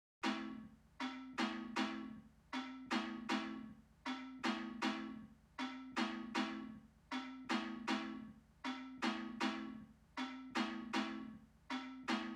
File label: 157 BPM A Min Mallet, Loop Stems; Melodies; Melody Mystery Expansion; Frost